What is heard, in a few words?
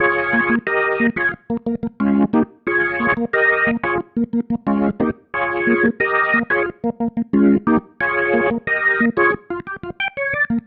Pattern, Organ; Sonic Foundry (Sony Creative Software); Joe Vitale Organ Donor